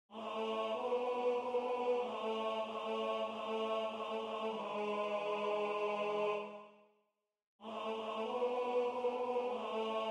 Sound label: The Hills Grow Lighter tenor; Potpourri 2025